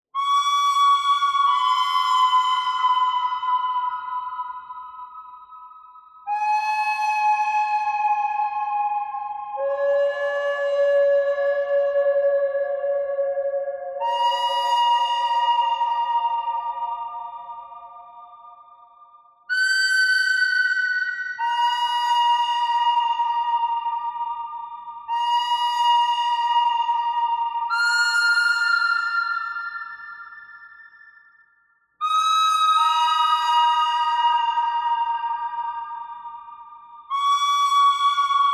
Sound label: bird thread harmonic